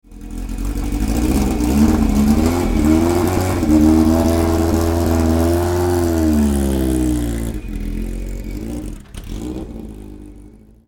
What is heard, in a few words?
Chevron B19